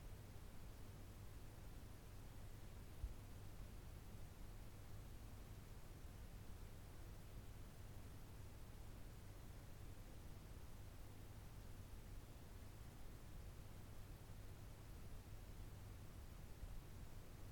zoom h1n maksimum gain de kendi dip sesi; mikrofon

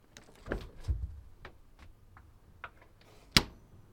buzdolabi kapisi acma kapatma sesi; buzdolabi kapisini acma ve kapatma sesi

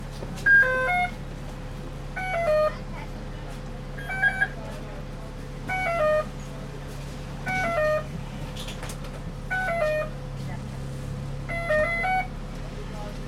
otobuse kart basarak binen insanlar ve akbil sesi; iett

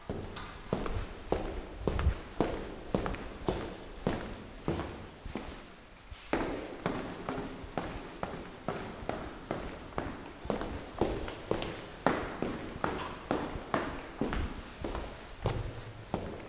apartman bina icinde yurume ve merdivenden inme sesi; apartman icinde yurume ve merdivenlerden inme sesi

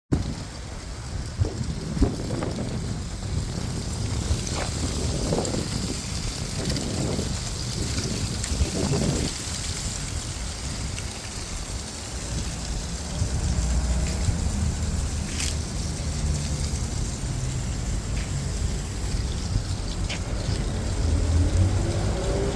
kurumus yapraklarin sesi, kurumus yapraklarin ruzgarla dansinin sesi

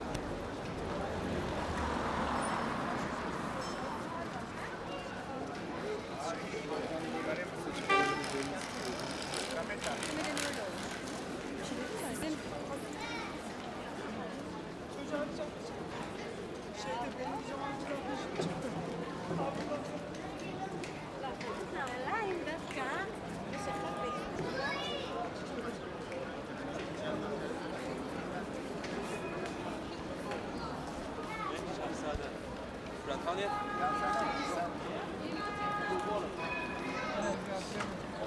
sokak trafik insan sesleri konusmalar tramvay sesleri cemberlitas; cemberlitasta sokagin insanlarin ve tramvayin sesi

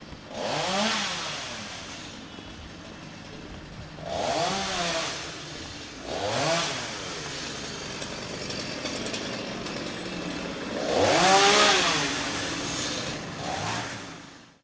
elektrikli zincirli agac kesme makinesi testere sesi

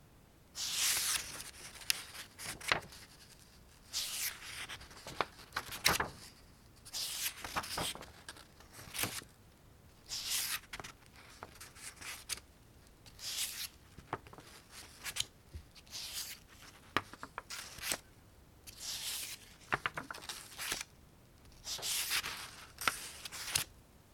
eski kitap sayfa cevirme ve kagit sesi; kitap sayfasi cevirme

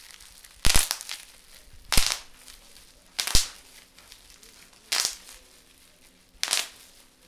havali naylon patpat sikma patlatma sesi

